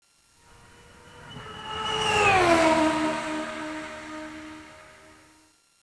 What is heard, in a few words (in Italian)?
indy, motori